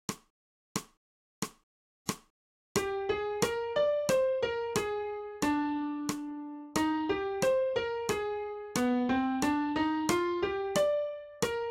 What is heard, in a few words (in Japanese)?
新フレットレス